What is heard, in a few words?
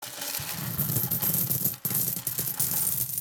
lucky gift win